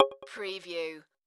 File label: Multimedia Percussion